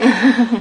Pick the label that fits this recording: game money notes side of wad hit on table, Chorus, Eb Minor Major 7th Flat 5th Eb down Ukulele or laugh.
laugh